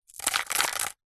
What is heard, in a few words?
foley jewels gems handful place into small container